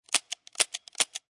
Pistol Revolver Dry Fire Three Times; Pistol: Revolver dry fire / misfire click.; Gun Handling Sound Effects

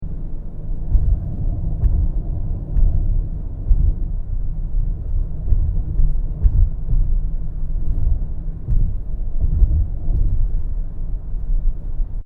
Car Toyota Kluger interior driving cats eyes loop

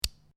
Lego Two Lego Pieces Clack Together; Miscellaneous Toy Sound Effects; Lynne Music

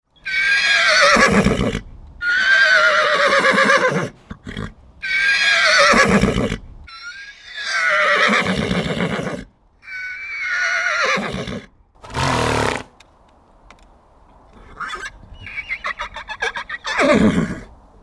Horse Whinnying, Various horse whines and breaths; Animals

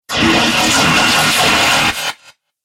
Dissolving Laser Ray Blaster; Laser Gun Sound Effects

